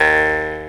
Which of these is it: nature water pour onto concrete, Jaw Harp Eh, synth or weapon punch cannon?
Jaw Harp Eh